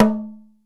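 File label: talking drum; Univers Sons; Basicussions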